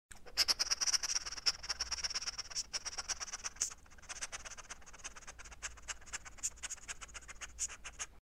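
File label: sound of a hedgehog sniffing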